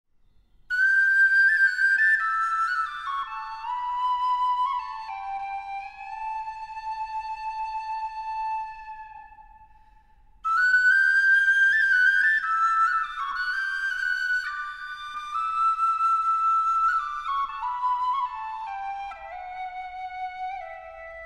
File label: Penny Whistle Song; fluiten; harp